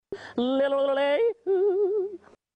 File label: Yodel